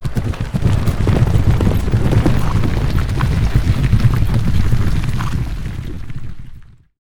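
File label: Horse herd galloping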